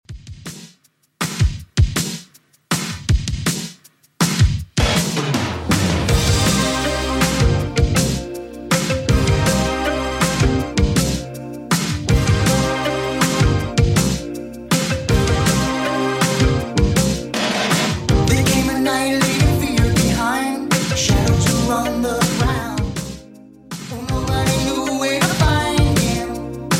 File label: 80s Redrum 80bpm